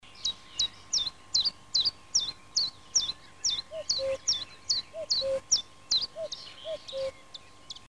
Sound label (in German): zilpzalp